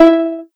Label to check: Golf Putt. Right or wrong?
Wrong